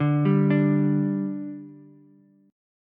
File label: G 5th G Ukulele, chord; G5 arpeggiated